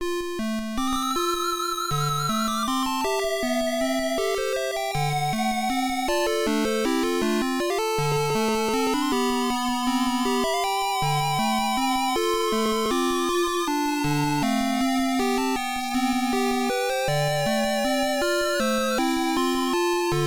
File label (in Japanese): ゲームオーバー (PSG版)